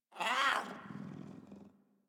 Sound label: Kitten Speak Playful; Warframe; Catbrow